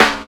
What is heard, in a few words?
SNARE 2; East West - Phat & Phunky CD2; 90's Sample CDs